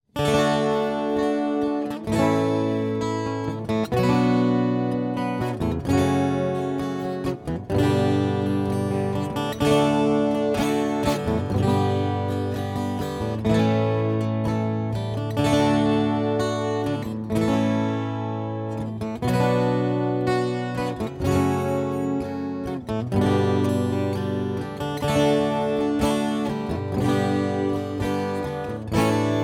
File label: Pachelbel Canon